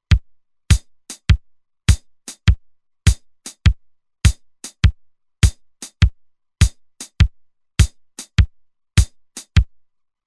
Reggae, Roland TR-505; Factory Rhythms

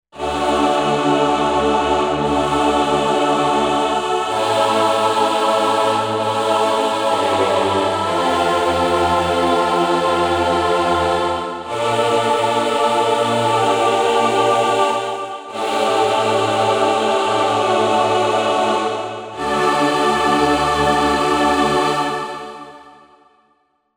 Choir

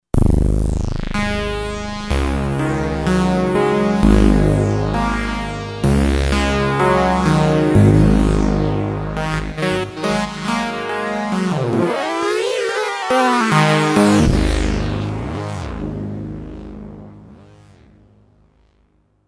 Schweepa, Roland V-Synth; Factory Patches

